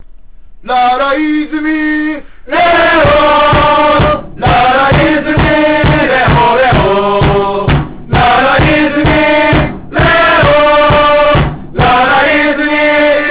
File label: song